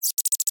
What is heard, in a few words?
insect chirp vocalization; sound effects one